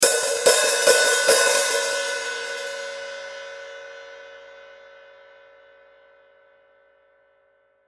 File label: Drum Hi-hat; Drum Hi-Hat Zildjian Avedes 14 Inch Open Multiple Hits; Blastwave